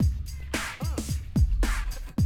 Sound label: 110, Classic Drum Loops